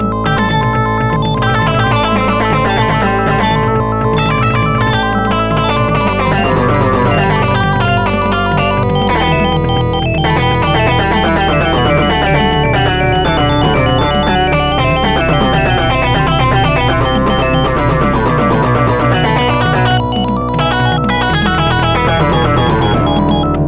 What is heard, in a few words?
metallic dream; Instant Music